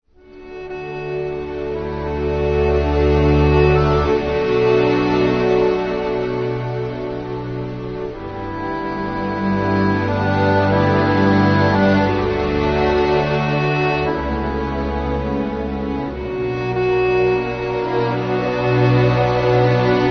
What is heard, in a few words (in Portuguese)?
romantico